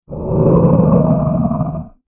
Monster Growl; horror sfx vol 1

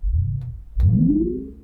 Woom, chairmat; Micro Pack

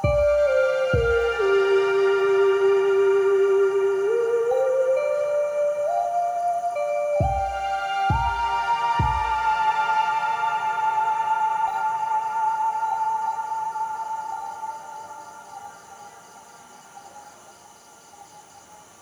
Spooky Solo; BB 2HTC Samples Vol 4; Solos

